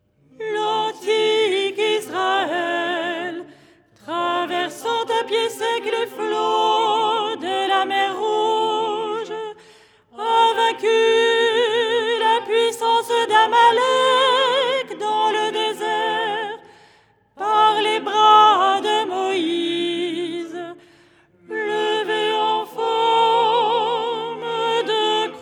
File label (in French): soprano; 1er hirmos du canon du dimanche, 2ème mélodie; Les 8 tons en français; ton 4